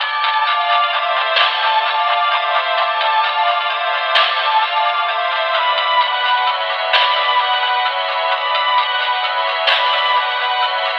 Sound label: Ring Tone; soma gamerip 2015